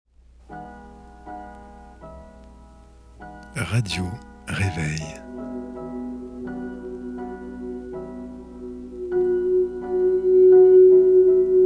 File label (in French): Generique; Radio Reveil